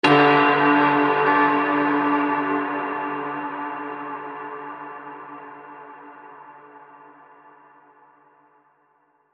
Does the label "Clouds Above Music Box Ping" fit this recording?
Yes